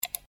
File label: mouse click